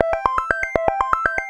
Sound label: Vibe Win Transfer; JackpotDarts; ClubFusion